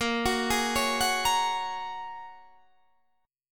Bb Minor 7th Sharp 5th, Guitar, Half Step Down

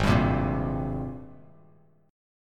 Ab Major 9th Ab down Bass Standard; AbM9; strummed

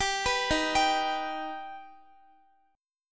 Eb Augmented Eb, Guitar; arpeggiated

